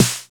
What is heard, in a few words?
DR. LOOP, DRUMS; X-Static Goldmine 3